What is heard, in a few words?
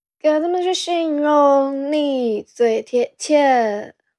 score vocal